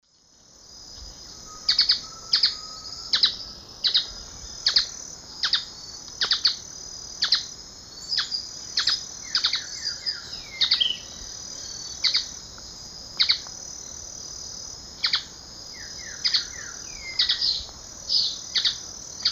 Cranioleuca vulpecula, call; S. America; Eastern Lowlands

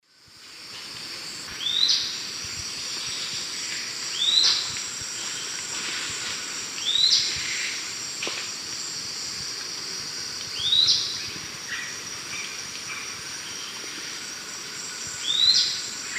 Tolmomyias assimilis, call; S. America; Eastern Lowlands

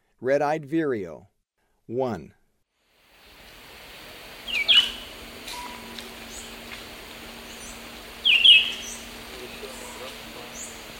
Red-eyed Vireo; S. America; Eastern Lowlands

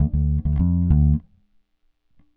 Jazz bass, Rock Bass

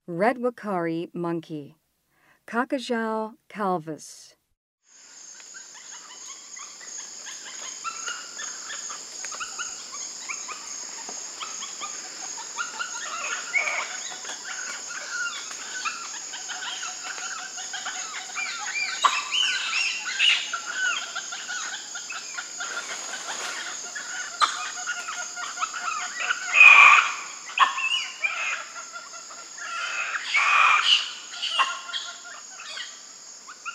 Red uakari monkey; S. America; Rainforest

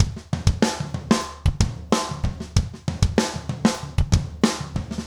rock toms 90

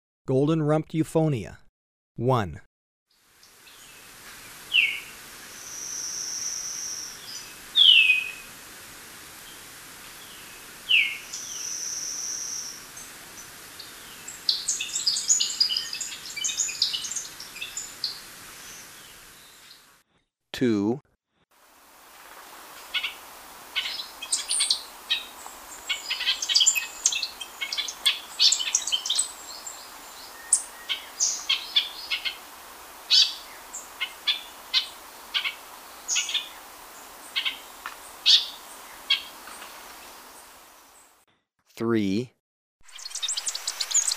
Golden-rumped Euphonia, Birds of Ecuador; S. America

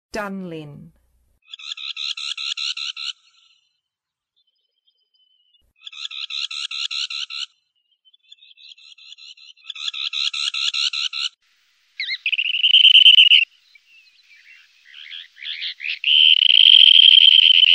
Dunlin; North America

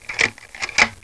PROJECTR, MECHAN

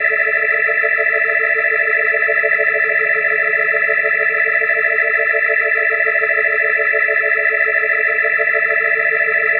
Organ A Sus+8va, Hip-Hop Organ Licks

